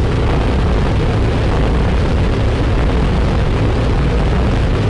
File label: jet room, Rumbles